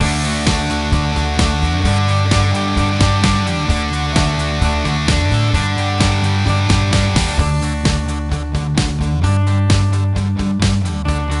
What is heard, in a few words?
Theme No Vocals; Clique Theme